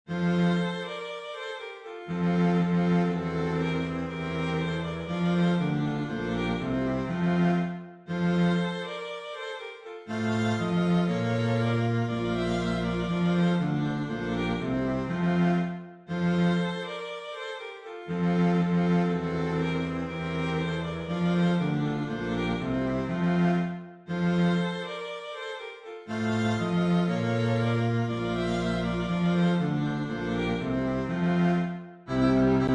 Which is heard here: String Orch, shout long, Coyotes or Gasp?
String Orch